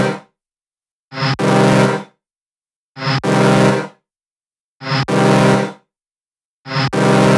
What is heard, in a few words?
130BPM Melody; Vengeance Samples; Pressure 1 Kit